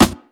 2000s dark sounding rap snare drum sound d sharp key